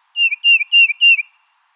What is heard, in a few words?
tufted titmouse